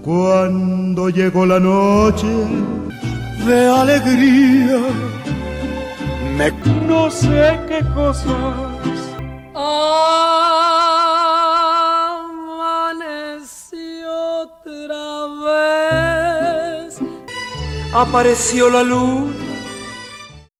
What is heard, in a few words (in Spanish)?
Glissando ascendente